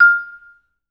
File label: VB MUTE F5; Best Service; Peter Siedlaczek's Advanced Orchestra